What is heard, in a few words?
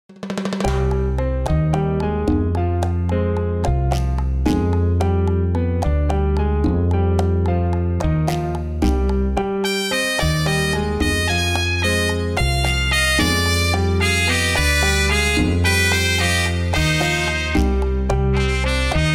cuban son montuno style